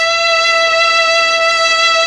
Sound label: STR, STRING